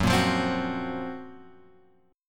F sharp Major 7th Flat 5th; F#M7b5 Chord {2 1 3 X 1 2} strummed

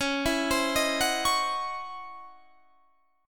Db Minor Major 11th Db Guitar Standard; DbmM11 arpeggiated; JGuitar

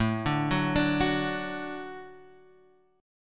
A 6th Suspended 4th, chord; A6sus4 Chord {5 5 4 7 7 X} arpeggiated; 6sus4:1,4,(5),6

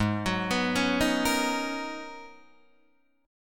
Ab Minor 11th, Ab, Guitar, DADGAD

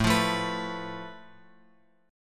A Suspended 2nd Sharp 5th; Asus2#5 Chord {5 8 7 X X 7} strummed

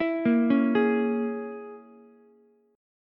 Bb Minor 7th Flat 5th, Ukulele; Bbm7b5; arpeggiated; JGuitar

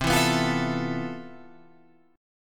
C Major 13th C down Guitar Open F; CM13 strummed; JGuitar

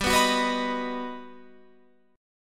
G Augmented Major 7th, Guitar, Open F; G+M7 strummed; JGuitar

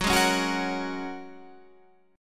G sharp 9th Suspended 4th F sharp

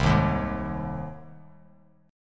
C Minor Sharp 5th C down Bass Standard; strummed; JGuitar

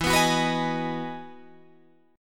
E Major 9th E down Guitar Drop D

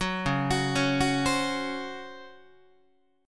Db Major 7th Suspended 4th, Guitar; arpeggiated; JGuitar

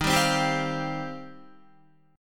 D sharp 6th Add 9th, Guitar; D#6add9 strummed

